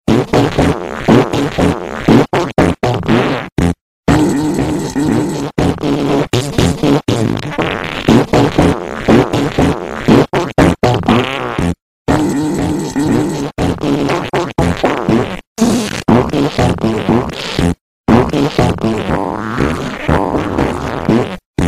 Jingle farts

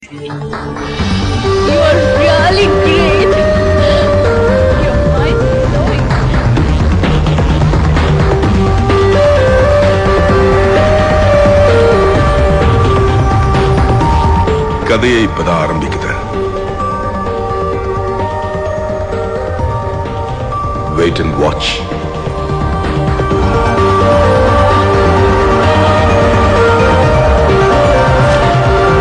Bgm

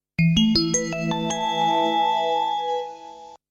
Station Notification

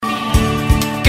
Mobile Ringtone

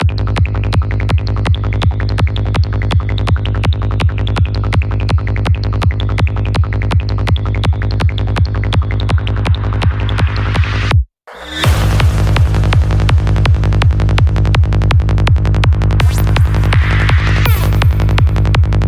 Nebula 165bpm

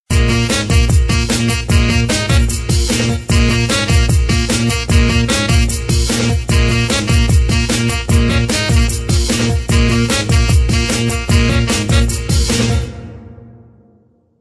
Juicy Jazz

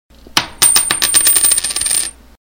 Coin Sound

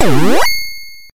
Coin; Starforce

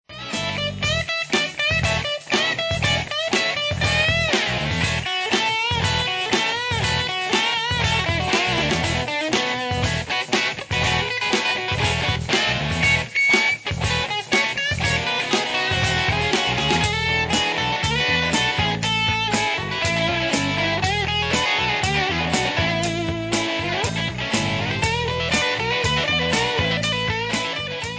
Super Funk

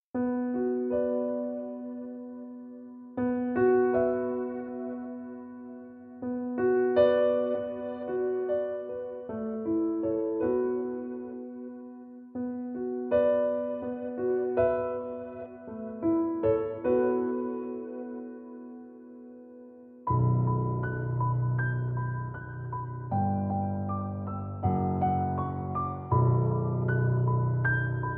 Sweet Dreams Piano

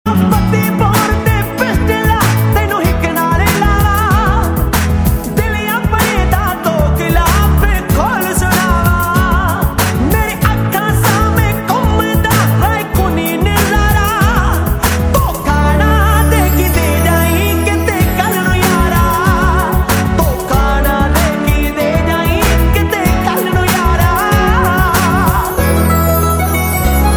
Punjabi

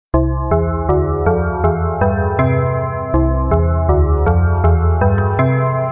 Ice Lolly Melody

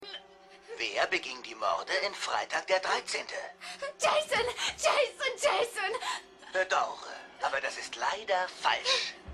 Scream - Deutsch

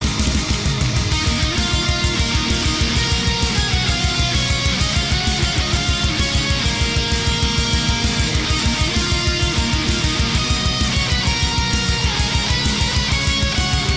Metal Theme; MH

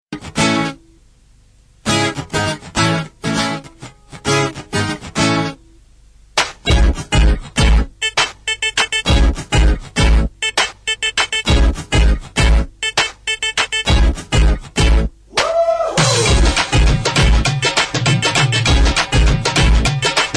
Nice Tone

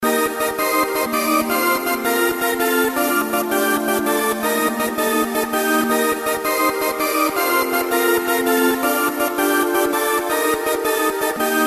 Raving

